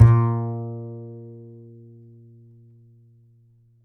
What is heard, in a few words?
D string upright bass; Upright Acoustic Bass